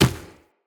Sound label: Infested Medium Run Dirt, Footsteps